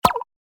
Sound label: kiss; RandomGFGoStop